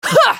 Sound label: spell cast effort, en US; Sivir